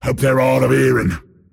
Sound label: move pain; Pudge